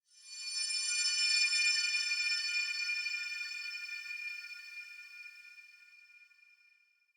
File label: High Baoding; Warframe; Clan Dojo; Dojo Randoms; Ambience